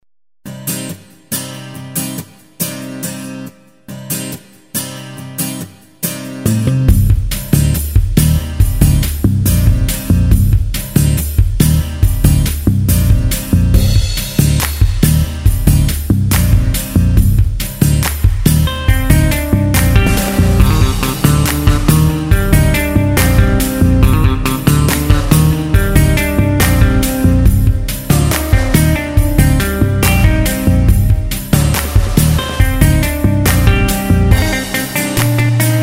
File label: Pop Folk Rock